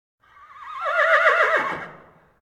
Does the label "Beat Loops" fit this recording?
No